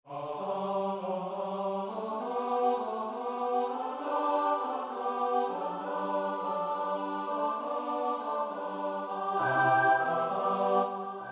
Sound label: midi; alleluja choinkowe